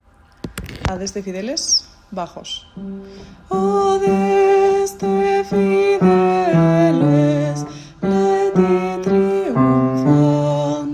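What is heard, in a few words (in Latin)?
adeste bajo; Navidad JOCSMAB 2023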